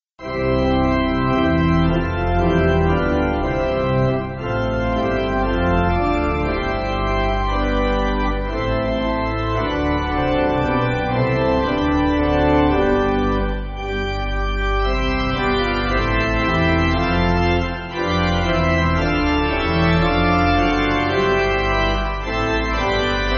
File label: Pipe